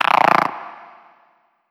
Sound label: Exterior Random Frogs